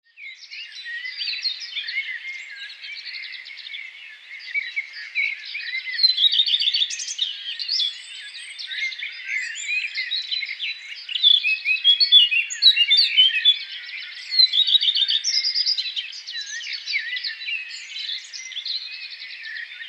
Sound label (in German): vogelgezwitscher